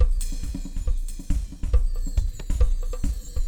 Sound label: Jazzy Moods 138